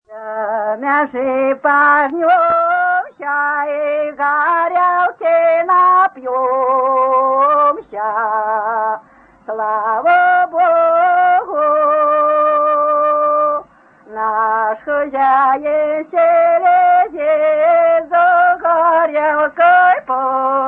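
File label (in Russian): Жнивные песни Невельского района; д. Борисово; "Да мяжи пажнёмся и гарелки напьёмся"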